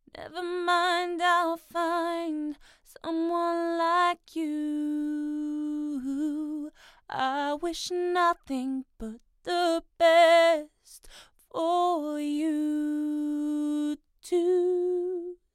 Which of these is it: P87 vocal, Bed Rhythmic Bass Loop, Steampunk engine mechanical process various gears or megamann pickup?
P87 vocal